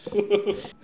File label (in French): rire